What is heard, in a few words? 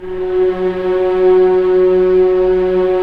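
cellos p orchestral; Roland LCDP13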